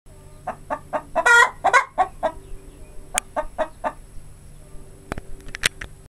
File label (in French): La poule caquette